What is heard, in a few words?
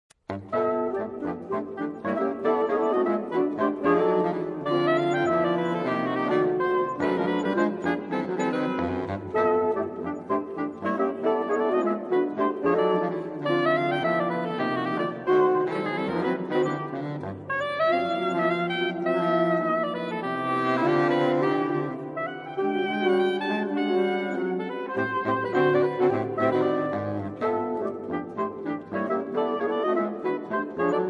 Jazz Suite For Saxophone Quartet